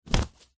clothing jumper fast short movement flap could be punch movement; zapsplat